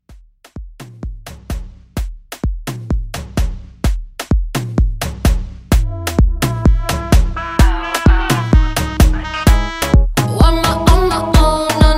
Afrobeat, 128

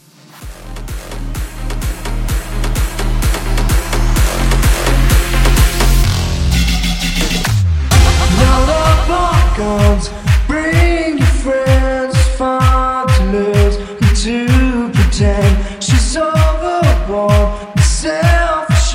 Bigroom Funk Hybrid

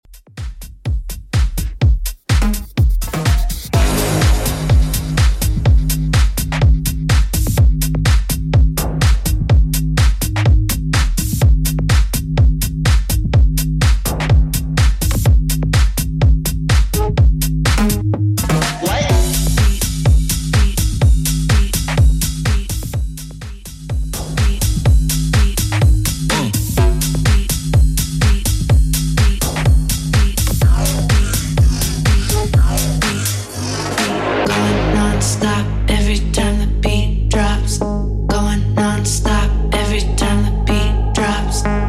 BHouse Redrum 125 bpm CLEAN

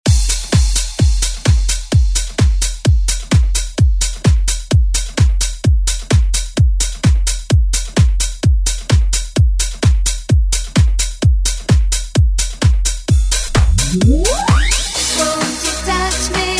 90s ReDrum; DJ Mhark

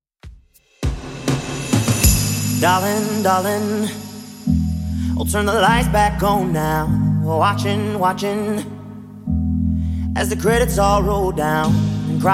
Redrum 100bpm

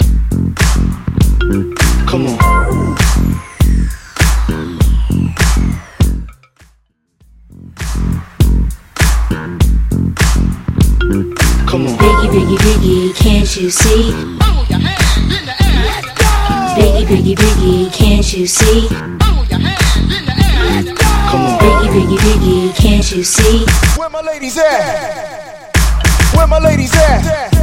90s Old School Mix Party Break Twerk 101 Bpm

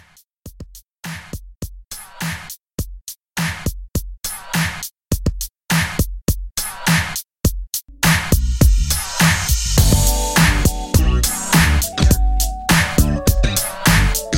90s Hiphop Old School

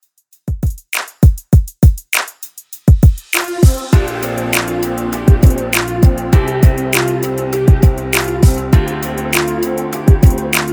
Top40 Redrum 100bpm

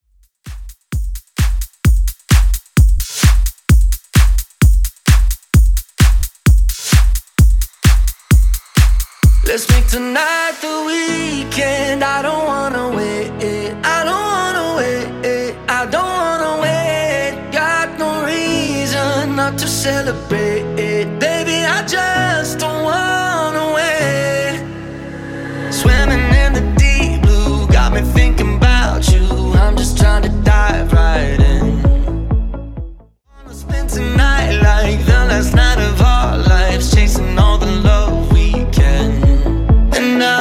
Re Drum 130bpm

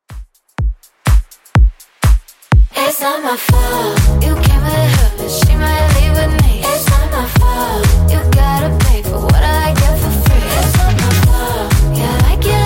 Top40, 124bpm; Redrum

